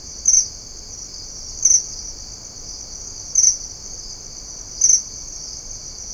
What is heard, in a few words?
Crickets